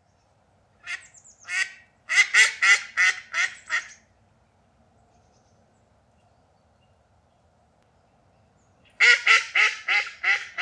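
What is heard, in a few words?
mallard anas platyrhynchos; kryakva; utok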